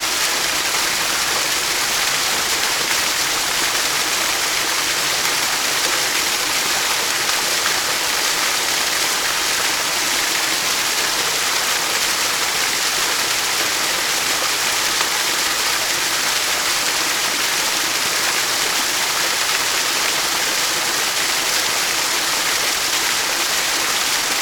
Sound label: water waterfall forest; sound effects one